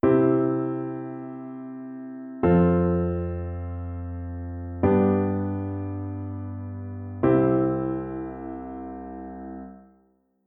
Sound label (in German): Kadenzen Einfach; einfache Kadenz auch Vollkadenz